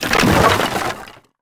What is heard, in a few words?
Wood Platform Brake